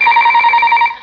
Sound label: time up